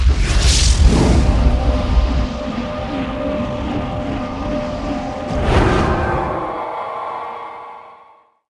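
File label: attack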